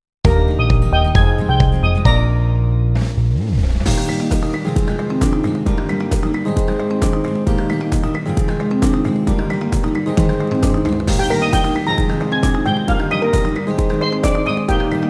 scuba diving

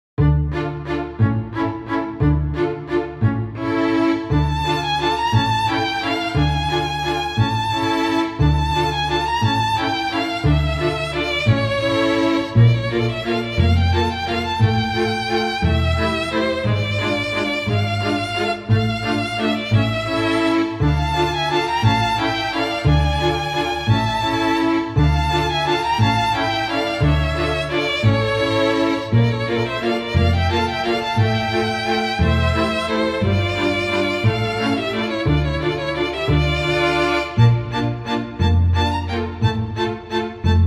Suspicious dance hall slow

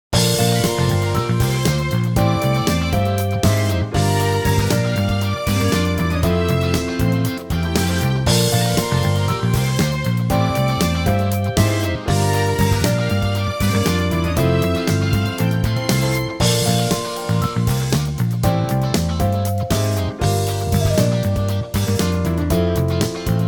new shop

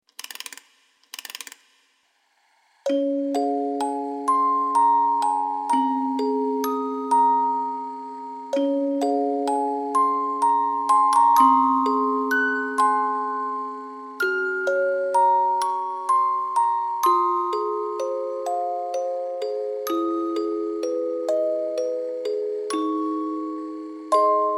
Music box of memories

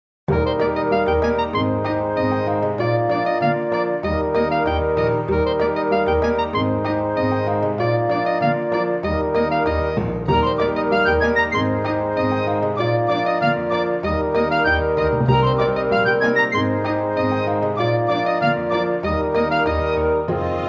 First entrance ceremony

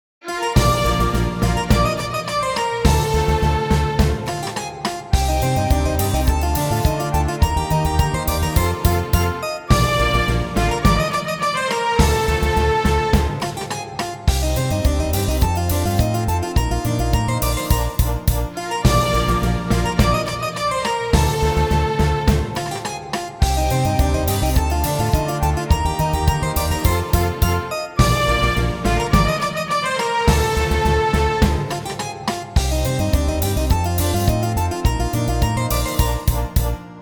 a bustling castle town

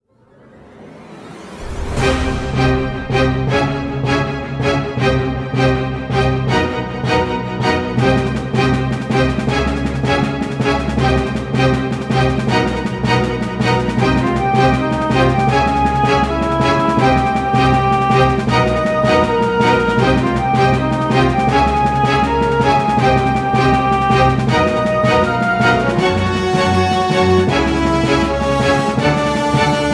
escape No intro, bgm

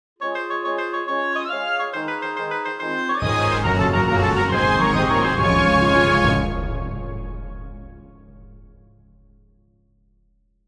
fanfare

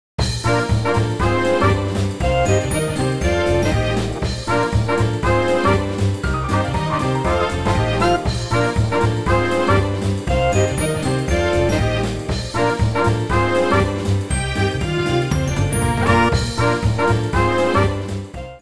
chance time

